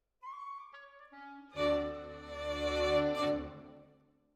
Violin Solo

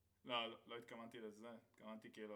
Ride

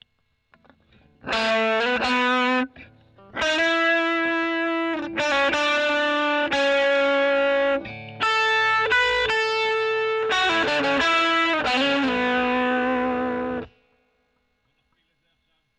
E. GTR Gefell OD

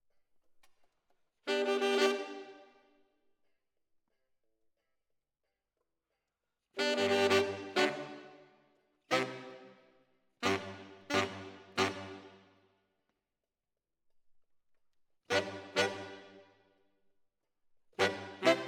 ORTF SCHOEPS, piano

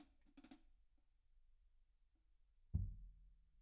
Room

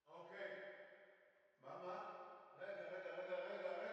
Drums Verb

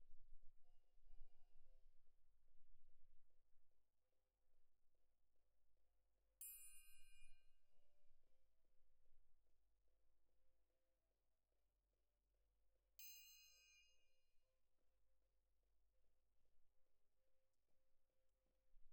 Perc Main

